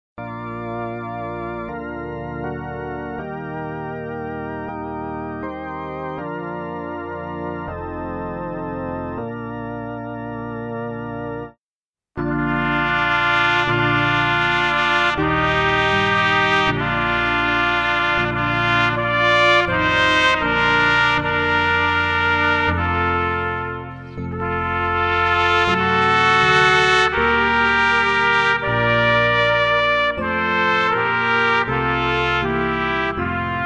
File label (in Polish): sancta maria 2 trabki